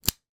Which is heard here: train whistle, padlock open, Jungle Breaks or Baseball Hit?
padlock open